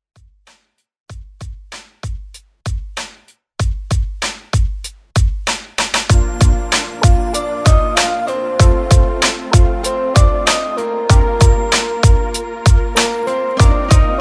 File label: 90s Pop Redrum